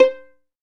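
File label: PIZZ VIOL, Solo Violin; E-MU Producer Series Vol. 2; More Studio Essentials; Composer; St. Arco Solo Violn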